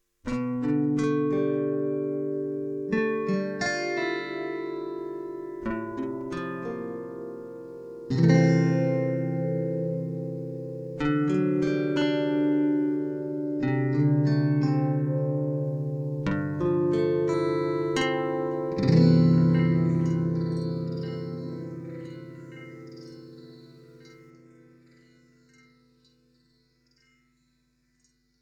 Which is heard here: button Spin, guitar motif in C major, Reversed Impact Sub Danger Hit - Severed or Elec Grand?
guitar motif in C major